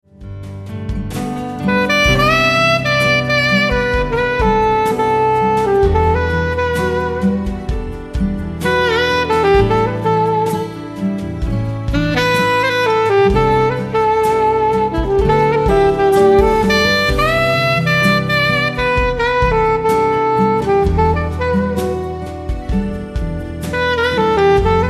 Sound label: Sax n Trax